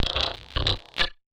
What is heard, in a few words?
Casio, Glitched, malfunctioning synth